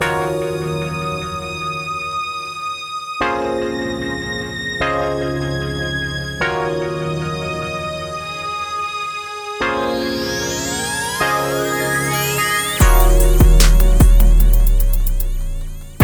Loops; New Loops Moving On

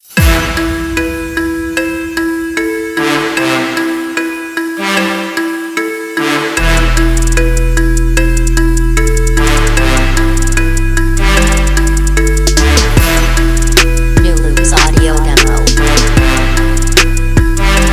Hard Trap Kit; New Loops

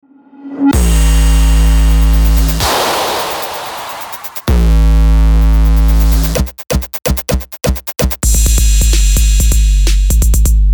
Analog 808; Drum Depot

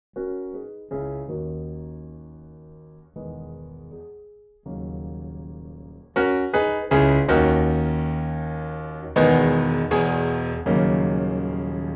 Progressions with Piano

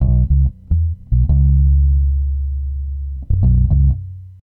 Bass

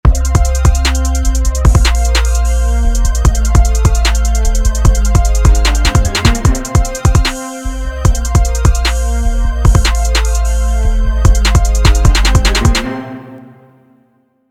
Drum

